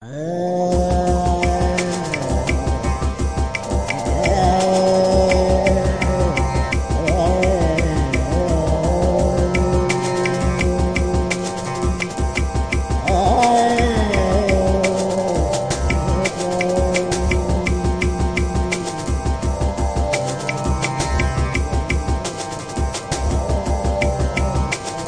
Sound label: Drones; Drones are Fun; REMIXABLE COLLECTIONS; alex merced shorts